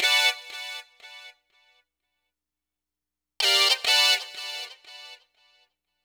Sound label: Synth, Breakbeat